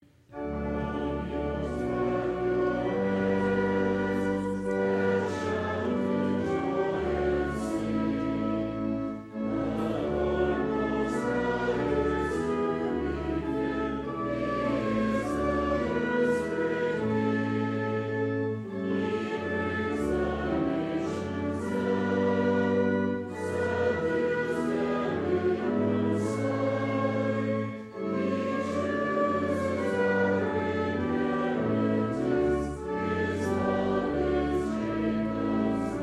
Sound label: trumpet